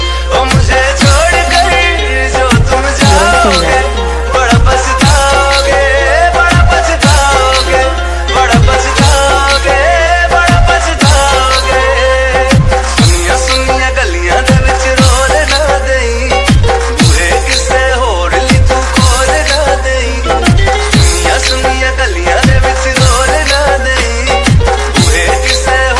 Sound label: ringtone